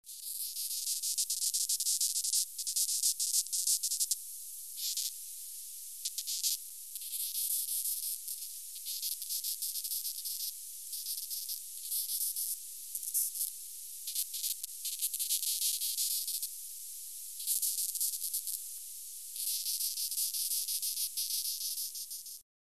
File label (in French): fourmi Stridulalion